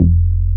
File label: CROMA, Synth Bass